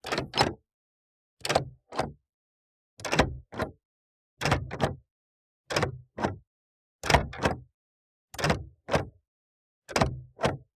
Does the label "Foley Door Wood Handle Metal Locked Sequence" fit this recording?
Yes